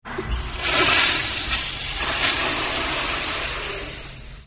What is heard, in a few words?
flush; usa 2000